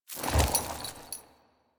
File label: Horse Brakes Trot; Foley Actions; Warframe; Vehicles; Duviri